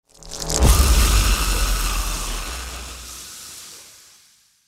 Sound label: feral ghoul radiate; npc